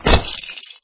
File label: fall apart sound; lego star wars